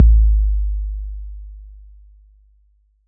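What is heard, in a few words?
bass drum 808 Decay E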